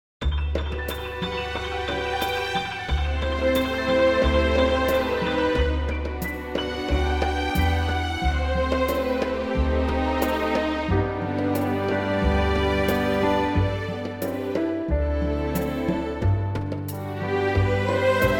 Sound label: orchestral G